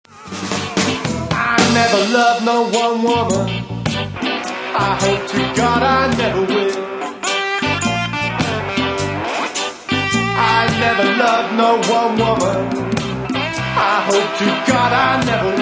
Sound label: blues